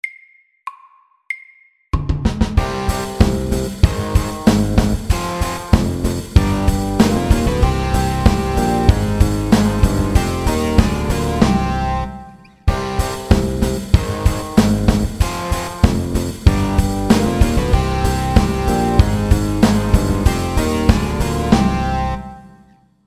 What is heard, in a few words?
Minirock FAST 95